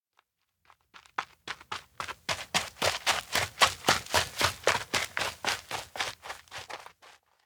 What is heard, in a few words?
steps outdoor ground run; koyomi; anemoi